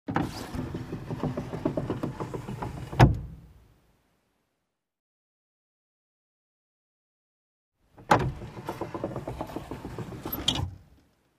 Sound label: Car glass lowered, raised manually